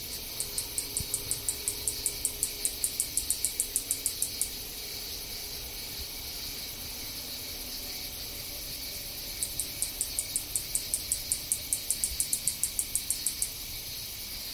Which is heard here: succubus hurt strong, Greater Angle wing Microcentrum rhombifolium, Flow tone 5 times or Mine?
Greater Angle wing Microcentrum rhombifolium